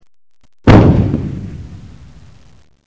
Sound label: Howitzer Artillery Cannon Fire